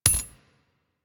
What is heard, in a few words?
Flak Cannon Shell Impact; Warframe; UT Flak Cannon